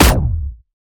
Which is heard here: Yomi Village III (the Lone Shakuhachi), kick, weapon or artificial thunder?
weapon